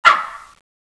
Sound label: jungle; Renaissance